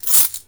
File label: key; Untitled Maze Game